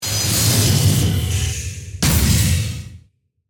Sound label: Mechanical Pneumatic, Assembly, Transforming, Robot, Production Element, Imaging Element, Accent, Transition; Spacecraft